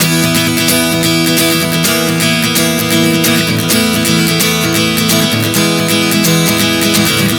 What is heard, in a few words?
E 130 bpm, acoustic guitar chord progressions